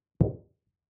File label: hit; Bildschirmflausch-LD41